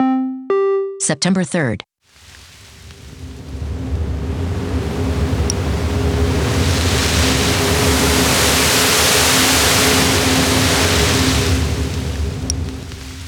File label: wind and rain sonified; Hermine